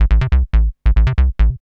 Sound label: TECHNO BASS 140 A